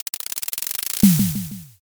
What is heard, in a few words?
drumroll